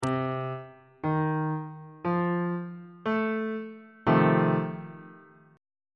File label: e sharp dominant 7th 2nd inversion on bass clef